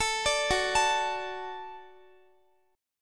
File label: Gb Minor Sharp 5th Gb Guitar; Gbm#5; arpeggiated